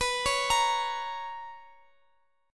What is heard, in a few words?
B Major 7th Suspended 2nd Guitar Open F, chord; BM7sus2; arpeggiated; JGuitar